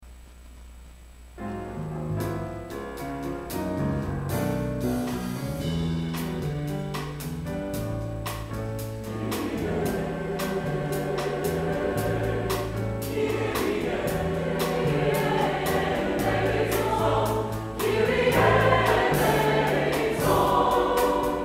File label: A Little Jazz Mass Kyrie